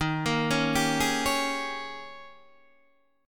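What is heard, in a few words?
E flat 11th E flat, down, Guitar, DADGAD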